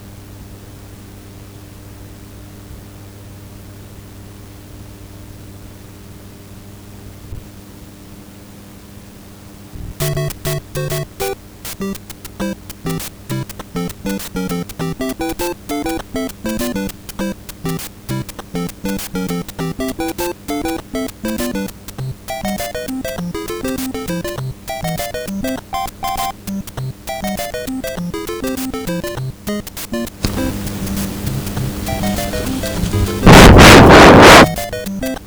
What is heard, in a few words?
Famicom Buzz